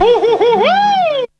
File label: toon; Zero G - Man Machine